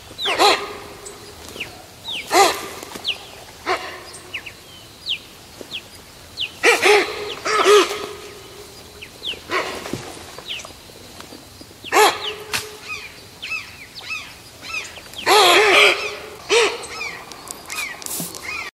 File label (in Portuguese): Capivara